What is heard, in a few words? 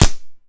Gun Paintball Shot; Weapons